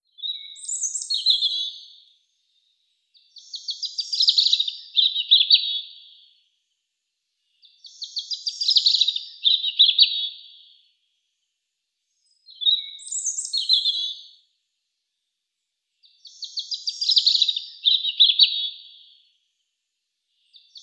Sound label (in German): rotkehlchen; Voegel